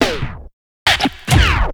filter roll

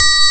triangle; E-MU Formula 4000 Series Vol. 5; Protozoa; Proteus2 Instrmt

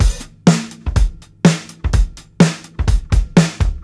125 super swung, drums; LOOPS; BASEMENT DRUMS VOL 2

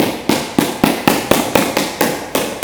dribble, sports; AKAI S6000 CD-ROM - Volume 1

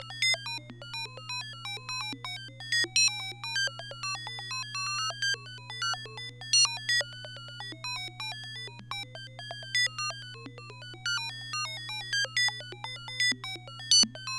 126, SYNTH SEQ; TEK S+H; AMG; Global Trance Mission VOL-2

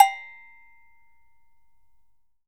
AGOGO LO LON, PERCUSSN; Big Fish Audio; Ross Garfield Drums VOL-2; GM PERCUSSN

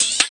RSS, 160 BPM

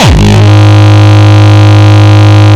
Longkick, kicks

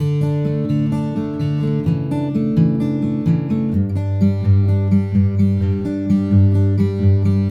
Acoustic Latin Guitar 128BPM Dmaj; Acoustic Latin Guitars; Vengeance; Essential Clubsounds Vol.4; Guitarloops

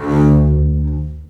String Collection, MARCATO; East West - The Ultimate String Collection

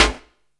realistic, snares; Zero-G - Funky Elements

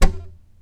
TAP, FLAMENCO Guitars

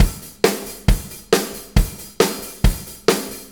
4-4 Loop, Drum Loops, Full Kit Loops

